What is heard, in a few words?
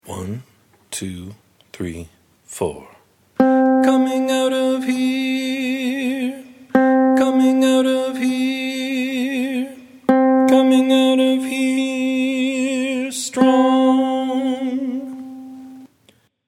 chorus